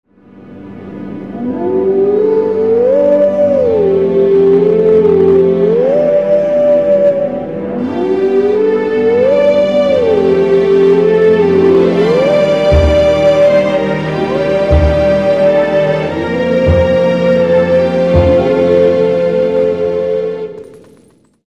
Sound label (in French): Chant du Loup; Twilight Princess